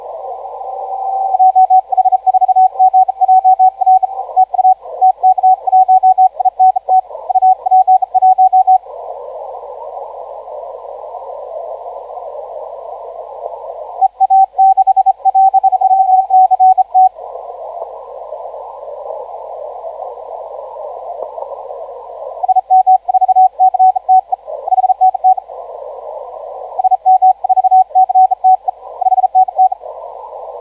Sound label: 20m